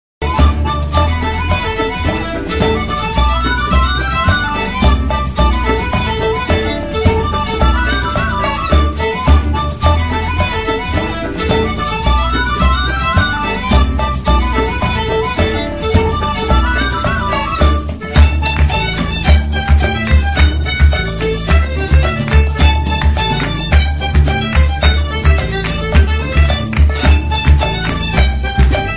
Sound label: Dance Sms